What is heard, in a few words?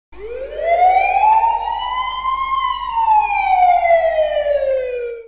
police; la resistencia juego